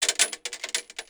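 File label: mg-42 shell casing hard; WAR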